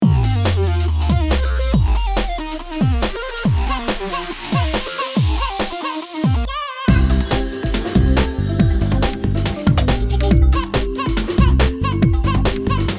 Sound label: battle festival